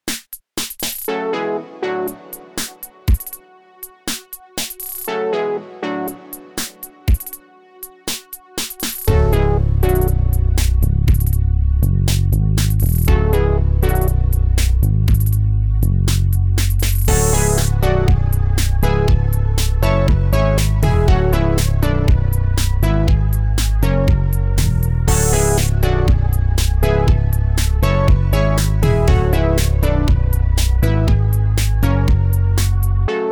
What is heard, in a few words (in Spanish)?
pista acomp